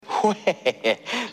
risa; Robin Williams

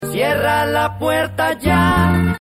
cierra la puerta cantado

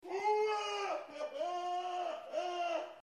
risa nerds

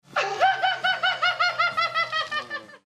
risa

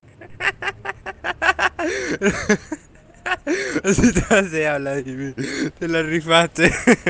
risa de bruja

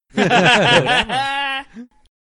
risa; negro sabio

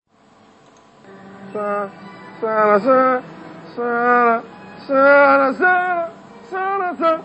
suspenso

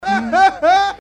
risas oficina

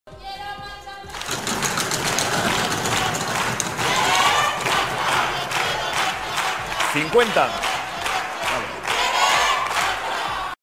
marcha

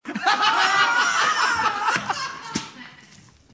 risa tv

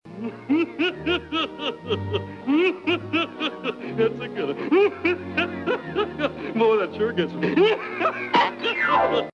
risa hipo; its a killer 2

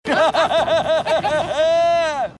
risa; austin powers

